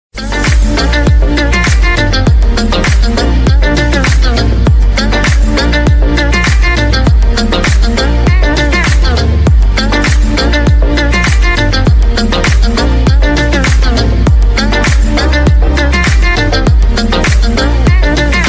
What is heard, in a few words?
cool iphone ringtone